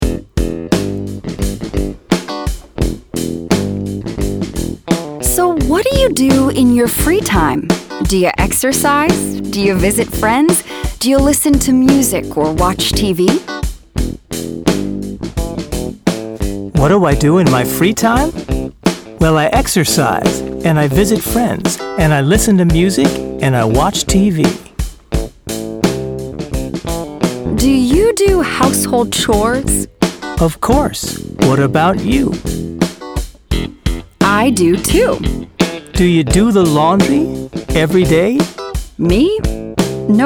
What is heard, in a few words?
Practi-Chants, My Typical Activities; Connectivity Foundation